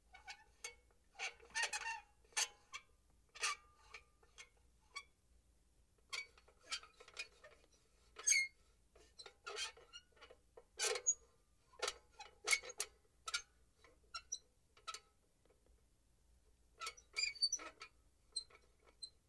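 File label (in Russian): скрипы на ветру; Звук раскачивающегося фонаря от ветра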